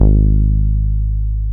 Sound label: BAS MKS-50, Roland